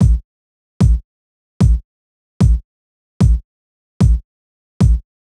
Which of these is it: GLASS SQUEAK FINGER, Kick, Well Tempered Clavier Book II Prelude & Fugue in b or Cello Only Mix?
Kick